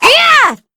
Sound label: slash; weiss